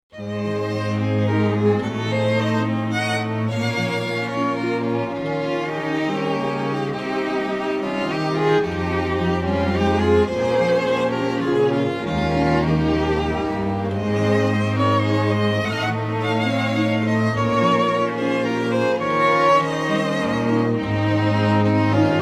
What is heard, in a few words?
Ballroom Waltz